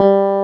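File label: Clavinet soft G1; USB Soundscan vol.09; AKAI; Keyboards Old School; CLAVINET1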